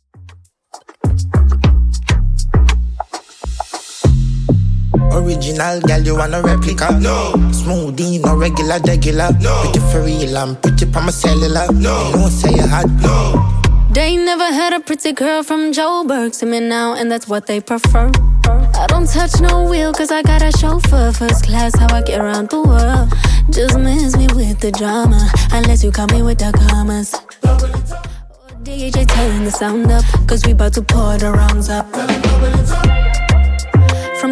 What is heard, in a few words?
Top40 100bpm; Xtend Edit